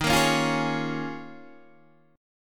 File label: Eb 9th Suspended 4th Eb, Guitar Open D; 13,13,11,11,11,11; down